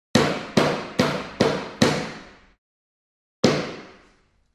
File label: Instruments Hammer, hammers